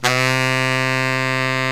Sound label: SAX COMBO